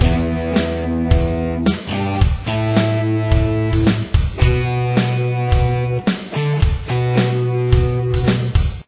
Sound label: horns